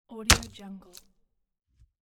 axe chop horror; wistansound

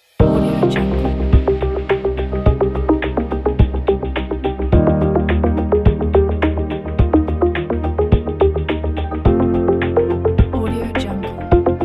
tech digital ident pack; elijah-studio

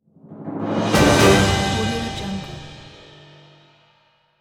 dramatic orchestral breaking news jingle

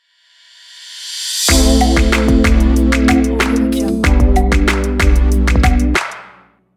short modern logo

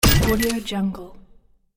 big button click; jbobstudio

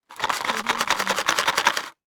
shaking cardboard box; lokohighman

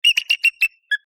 bald eagle short call; sound ideas

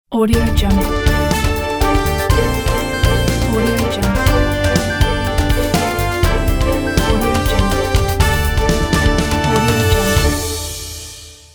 news logo

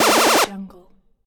8bit laser

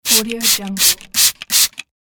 spray bottle

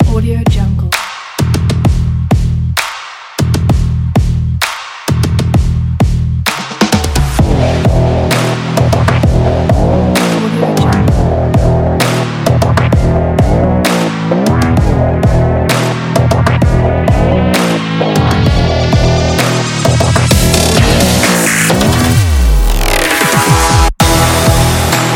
drive

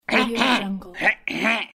funny old man clear throat

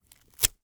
rope cut

